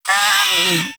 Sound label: Catbrow Feral Vocal Death, Enemies; Warframe